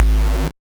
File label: Noise; musicradar; 8-bit bonanza